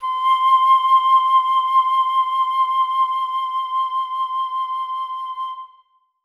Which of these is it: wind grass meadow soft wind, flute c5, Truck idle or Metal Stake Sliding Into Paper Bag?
flute c5